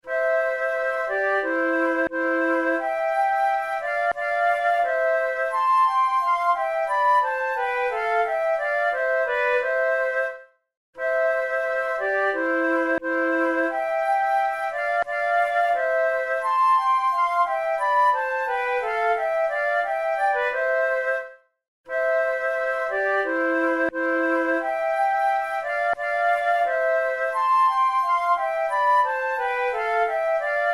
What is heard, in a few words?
duet, flute